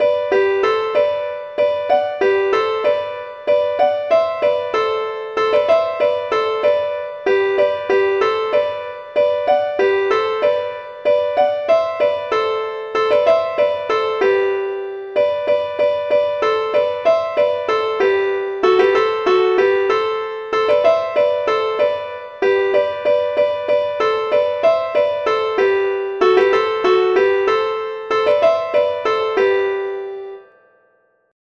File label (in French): valse